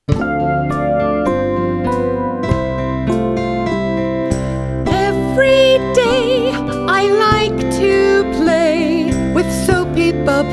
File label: Soapy Bubbles